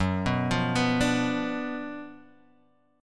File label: Gb Major 7th Suspended 4th Sharp 5th, Guitar Open D